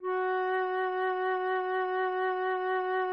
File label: flute Gb4